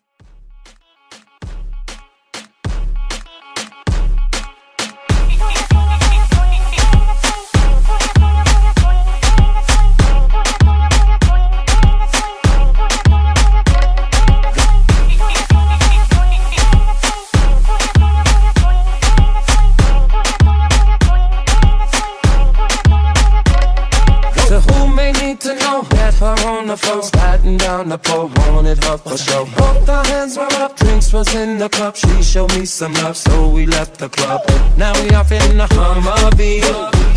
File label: Transition